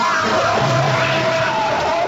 jumpscare sound; five nights at gipsy's

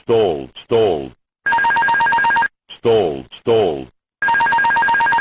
airbus a330 stall alarm

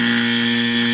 wrong answer buzzer

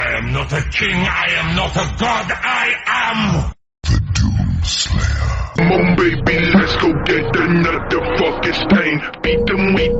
phonk; doom slayer

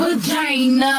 slurp

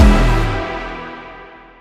kill; valorant champions 2024

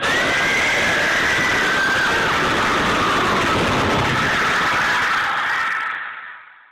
japanese scary maze screamer

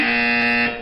no buzzer; family feud

